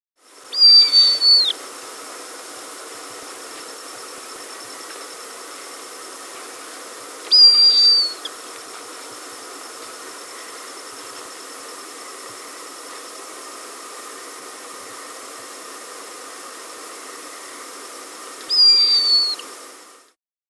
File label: White-Rumped Hawk (Buteo Leucorrhous, S. America